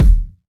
boom bass drum single hit d sharp key, kick tuned to the D# note